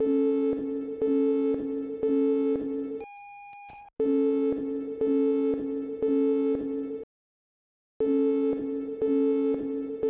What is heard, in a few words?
Soundwave